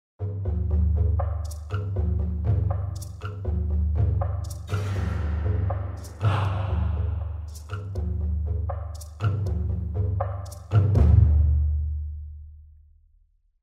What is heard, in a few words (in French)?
percussions